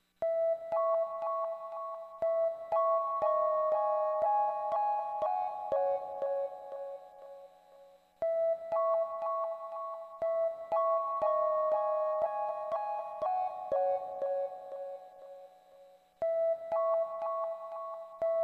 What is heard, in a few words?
chill